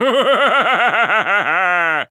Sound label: Wizard Laugh